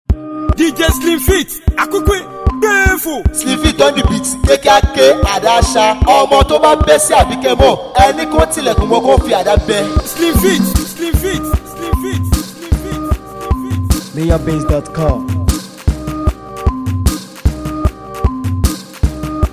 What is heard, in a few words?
Mara Beat